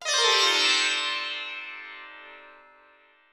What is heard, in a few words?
perc, Roland MC-909